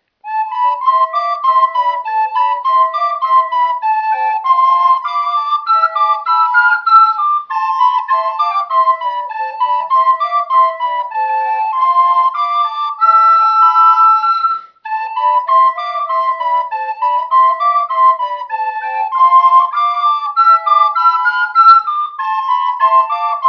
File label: menuett; spielfolk